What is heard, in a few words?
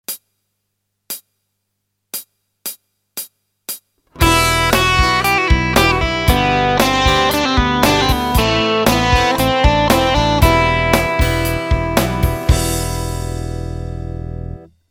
Slurring